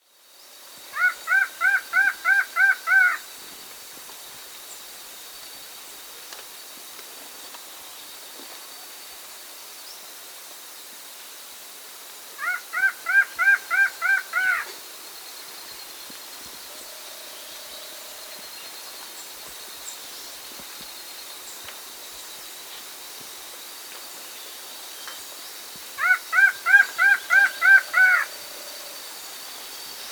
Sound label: Upland Antshrike (Thamnophilus Aroyae), Andean Birds; S. America